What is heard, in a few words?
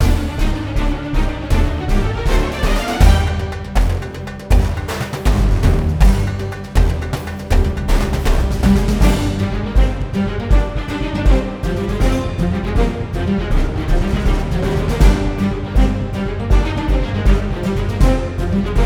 Sea Combat Desert Day